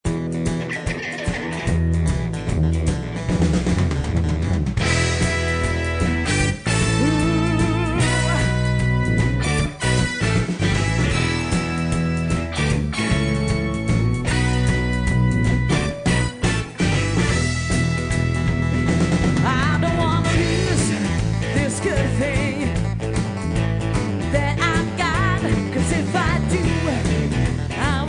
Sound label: livemusic